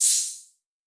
Destroy Powerup; boomerang fu

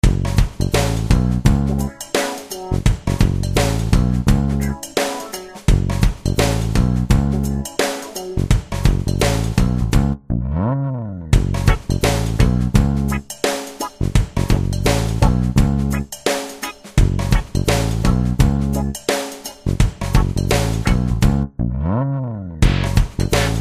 Warbly

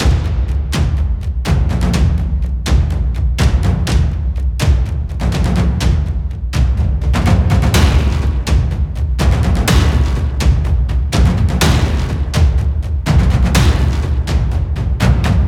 Battle Start Loop

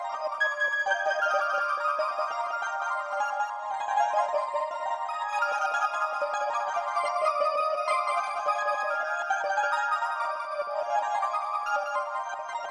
Autumn Starpulse Particle Jam; Proteus

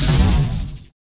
lightning impact; Star Trek Adversaries; macos

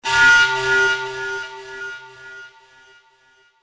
panflute; crysis warhead

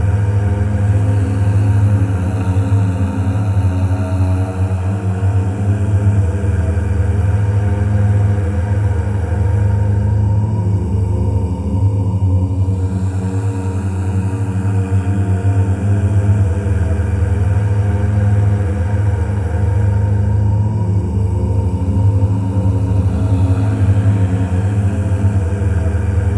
Ghost Choir; conan exiles gamerip 2017

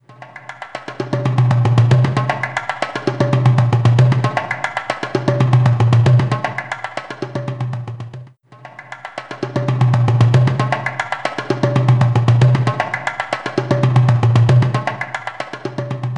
Drumming

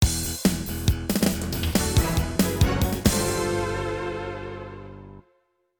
After Combat BGM (SC-55 Version); Asuka 120% Burning Fest.; X68000

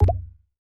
drum Bamboo Pop Notification

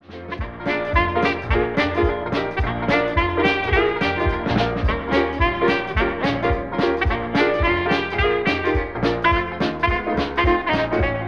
Jazzy Theme; Spot Goes to a Party; Spot and His Friends Spot's Busy Day

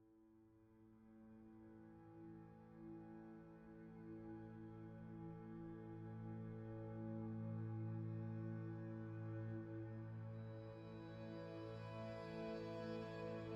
tonal Amb Full A

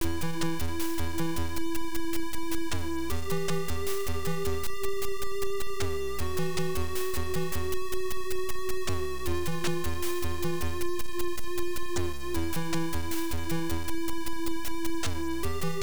Trap; Xak II; MSX2; PSG

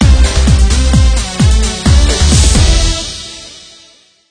Stinger Project Complete; Android

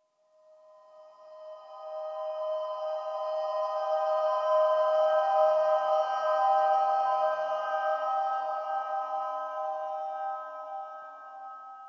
Water Girl Above Water Horror; inside

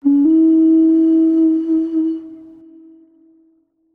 Player Out Jingle; Avatar The Last Airbender The Burning Earth; ps2 wii xbox 360

